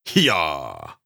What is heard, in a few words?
effort grunt male